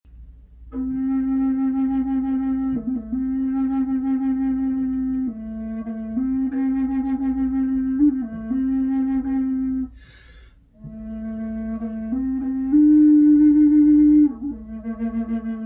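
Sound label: natural, wood flute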